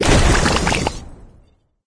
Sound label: Frog Land; candy crush saga gamerip